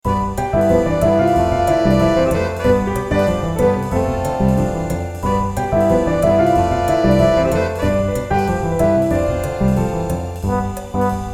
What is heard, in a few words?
Jazz